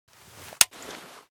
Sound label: glock18 firemode